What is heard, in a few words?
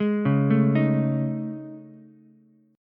A Major Sharp 11th, Ukulele, C6; AM#11; arpeggiated; JGuitar